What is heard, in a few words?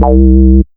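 Ensoniq ZR-76 Mono Square Bass C2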